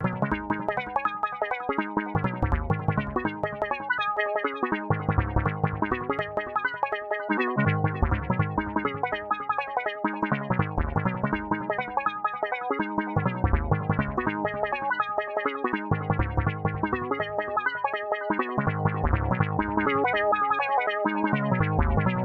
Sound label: MICROKORG; Spacejazzzzz sequence